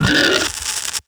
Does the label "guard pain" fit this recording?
Yes